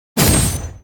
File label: atk katana